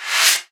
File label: Reverse FX